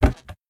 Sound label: wooden chest open; factorio; Factorio 0.14.21